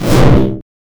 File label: barrier effect; rpg game